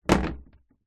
household plastic table set down or knock over wood balcony; zapsplat